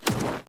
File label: npc tree golem little projectile